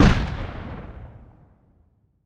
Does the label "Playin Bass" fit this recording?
No